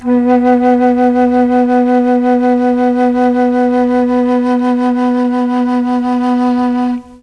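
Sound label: B1 chamberlin flute, Mellotron; Mike Pinder - Mellotron Archive CD; CHMBLN FLUTE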